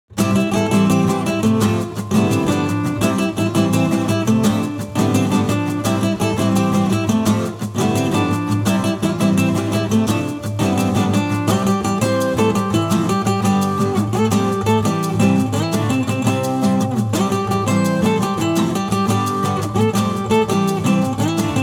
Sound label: Acoustic; Yoshis Island Overworld